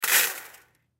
Rock Salt Pile Drop, Foley Rock Salt Pile Drop Metal; Blastwave